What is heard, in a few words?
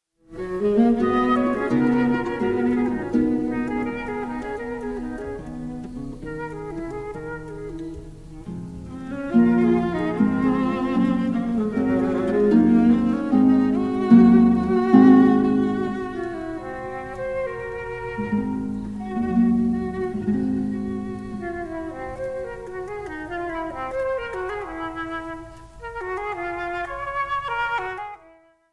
sonata for flute viola and harp; Claude Debussy; Melos Ensemble